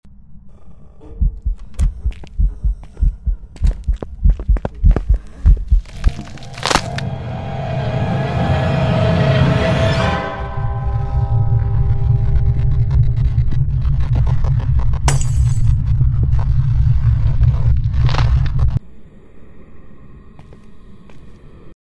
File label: death; Phasmophobia; gamesoundopedia